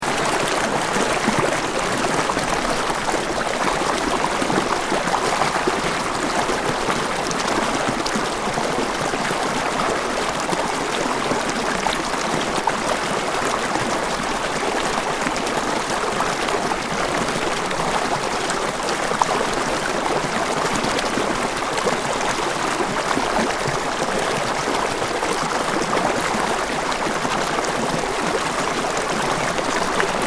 River Or Light Water Fall Loop, Stream, creek, river or brook; with water rushing, or a small, calm waterfall. Seamlessly l; River Sound Effects